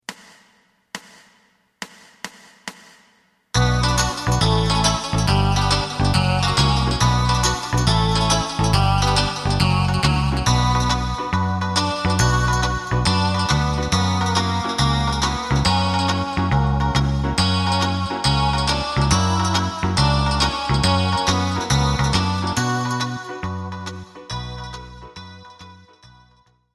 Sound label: drum